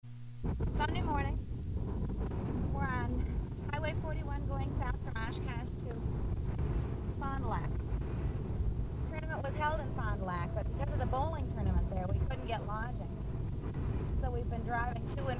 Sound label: noise; stevens hockey trip 1977